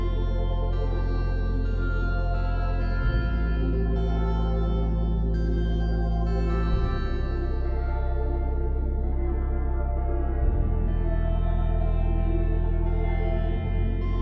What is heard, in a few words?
Lift Music; game audio; Location11; MiSide